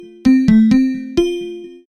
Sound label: short message tone; ringtones; sms